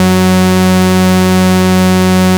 MC 202, BASSES; Masterbits - Vintage Voltage; VV BASSES

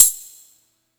Tambourine, Yamaha PSR E305

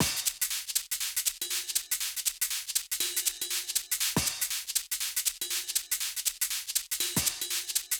Sh-Rd Loop 120, Hh-Shake Loops 120; Equipped Music; Loungin House

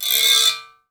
Iron Skillet, household rhythm samples Hits

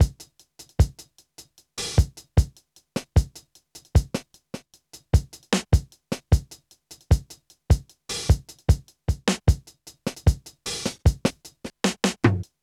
Only Ghosts, Drum Loops, Minimal; Zero-G Smoke

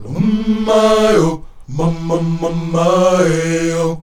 UM MAY, Afro Chants

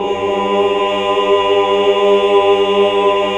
AAH

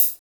TOP CHH 2, Drums; Drums In Your FACE!

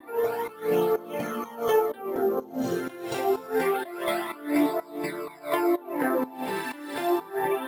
F 125 FX, melo filter loop; Pascal; RoBKTA Sample Pack Supreme

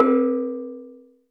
GAMLAN, PERCUSSION; Crypton - DrumBase5000; PERCUSSION#1

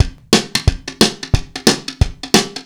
DRUM, COUNTRY

